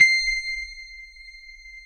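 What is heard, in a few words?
FM electric piano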